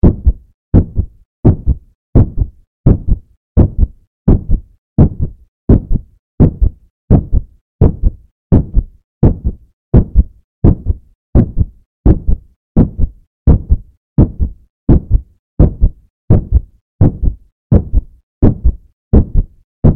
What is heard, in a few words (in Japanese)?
鼓動 モノラル